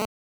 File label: click; SDL minesweeper